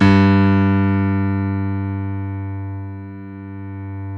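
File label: G1 piano right; Northstar - New Gold VOL-2; BOS.GRAND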